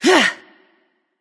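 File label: grunt attack, female; smite; voicepack; hera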